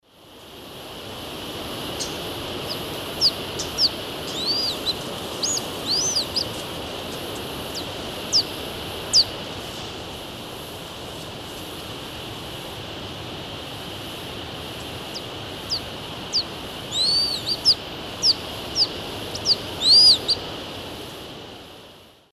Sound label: Harpagus bidentatus, soar calls; S. America; Eastern Lowlands